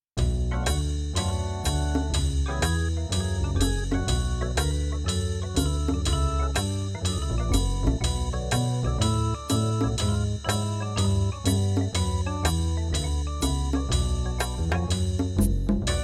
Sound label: Motorcar Organ; putt putt goes to the moon remastered